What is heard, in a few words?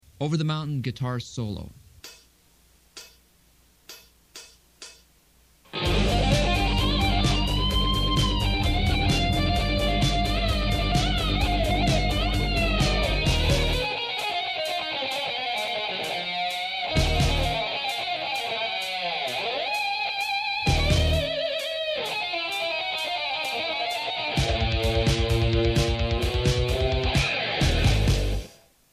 solo, guitar